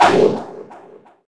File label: Flip; Trickstyle